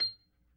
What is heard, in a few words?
B3 PERC, Vintage Keyboards